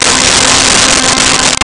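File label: Synth Strings C5; Nintendo; July 2020 Gigaleak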